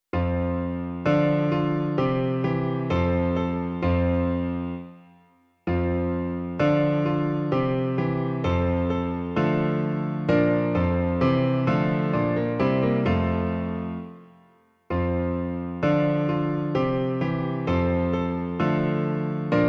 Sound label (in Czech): klavír; Ó Bože, jenž jsi v nebesích; Klavírní verze